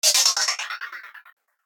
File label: Computer SFX short; soundbits